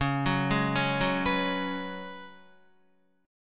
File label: Db 7th Suspended 4th Db down Guitar; JGuitar